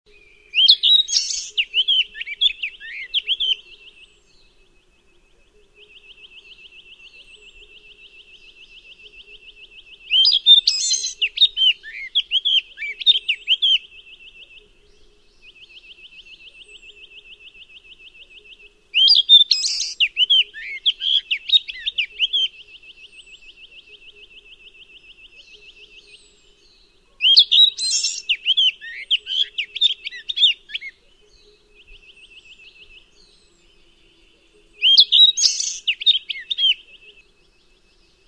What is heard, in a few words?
Elisas Flycatcher song